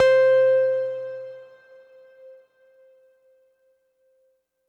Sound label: Synth C, Synth Prophet One Shots; Electronisounds